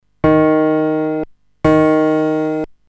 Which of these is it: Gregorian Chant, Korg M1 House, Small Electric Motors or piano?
piano